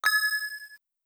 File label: Coin